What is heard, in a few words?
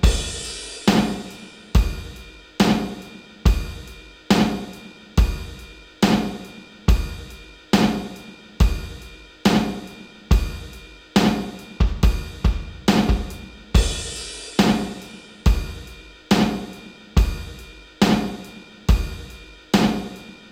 drums